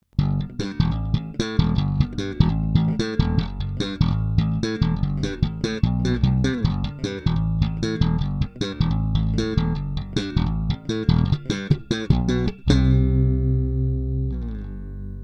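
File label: Gold-ASAT 97, bass; La bella; Rotosound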